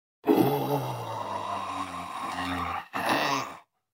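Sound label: quiet growling of a mummy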